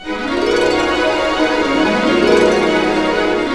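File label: 128, orchestra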